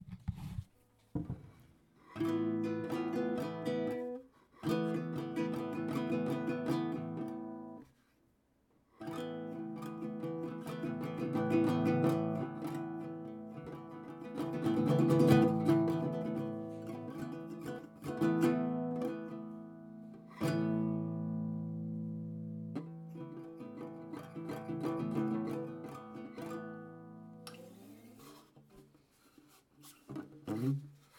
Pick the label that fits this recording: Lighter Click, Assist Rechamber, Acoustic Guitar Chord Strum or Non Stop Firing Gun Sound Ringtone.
Acoustic Guitar Chord Strum